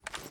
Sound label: reload empty drum start; gmod